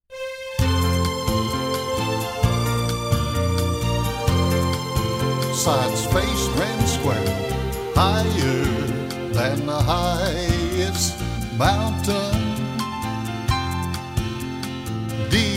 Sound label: vocal